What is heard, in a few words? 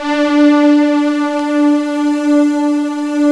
SY99 D4; SY99 YAM; East West; Global Sounds